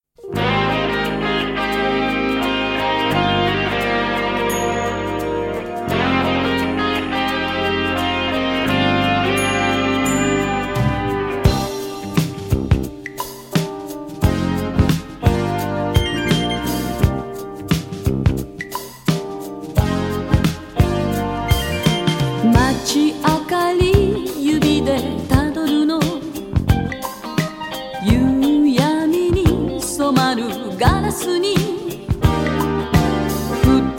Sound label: Female J Pop Boogie Funk